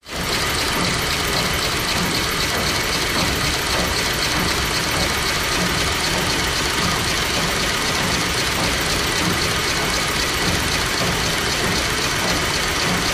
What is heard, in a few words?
industrial motor; engines